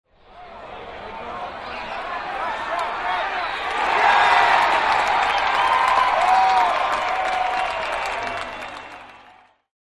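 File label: Medium Crowd Reaction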